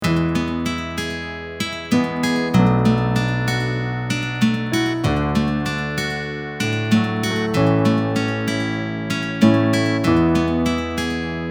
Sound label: Keys support Guitar Picking